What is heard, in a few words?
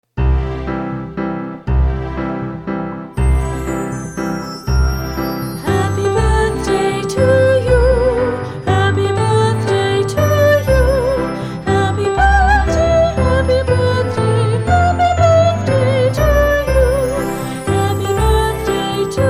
Happy Birthday in English Female Opera